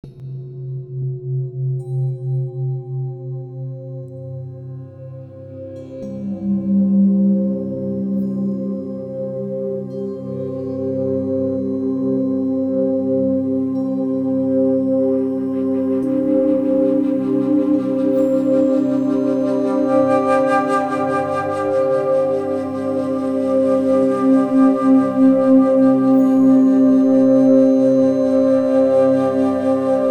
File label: Love Frequency Meditation